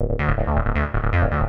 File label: rubber, bass, 160bpm